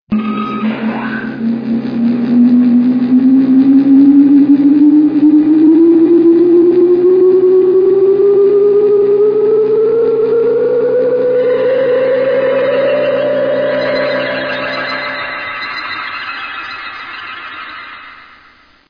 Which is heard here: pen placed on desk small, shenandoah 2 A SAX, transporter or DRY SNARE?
transporter